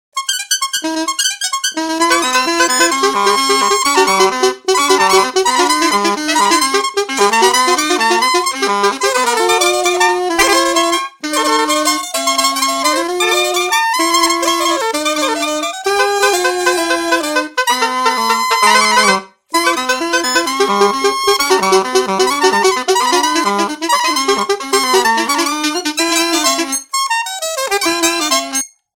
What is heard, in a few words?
sax